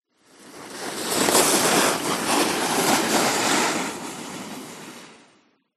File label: Snowboard By